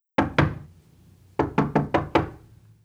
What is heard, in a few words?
Door Knock